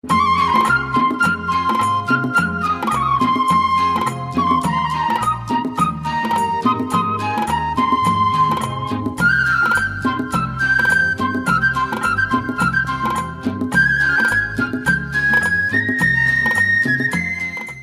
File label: malayalam evergreen tune ringtone